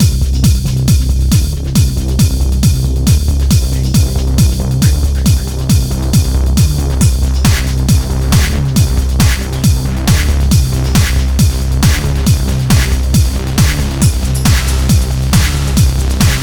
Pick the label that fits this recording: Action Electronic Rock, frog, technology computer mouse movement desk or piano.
Action Electronic Rock